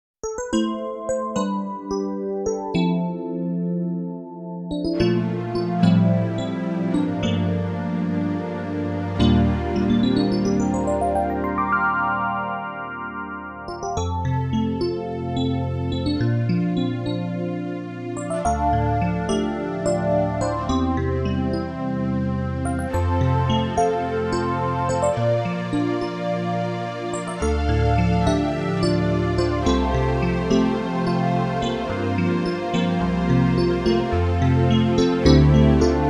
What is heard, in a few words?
modern electric piano; kawai ca501